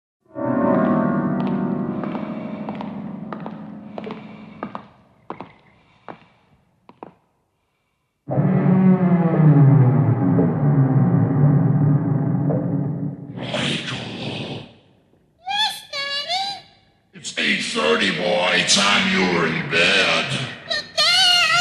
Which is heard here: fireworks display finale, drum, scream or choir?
scream